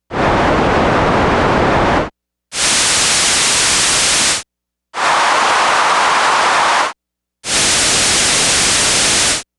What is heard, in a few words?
Filter